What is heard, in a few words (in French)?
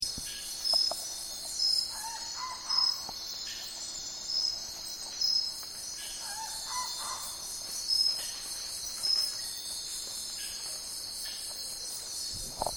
egothele de tate, chant